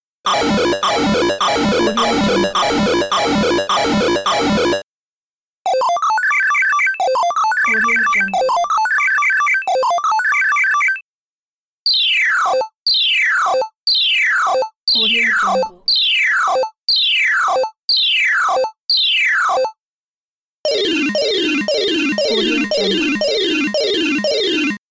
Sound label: retro gaming warning alarms